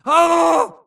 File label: grunt electro; Titanfall 2